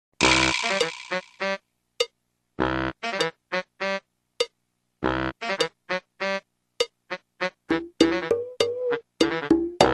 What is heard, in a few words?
pissed off theme; tommyinnit